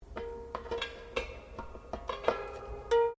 HAMMER DULCIMER EFFECT P, Old Chinese Hammer Dulcimer; Strings; Musical